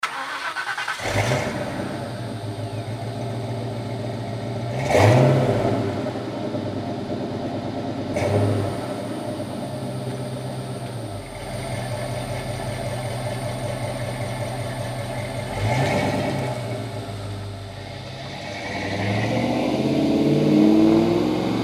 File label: Auto Stock H pipe Flowmaster